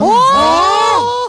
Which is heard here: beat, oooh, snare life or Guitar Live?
oooh